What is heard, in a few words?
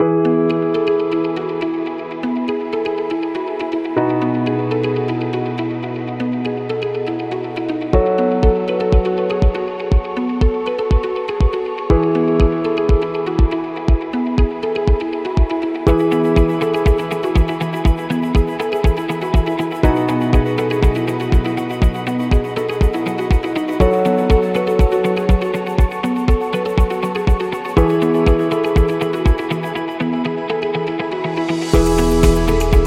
Positive Inspiring; positive vibe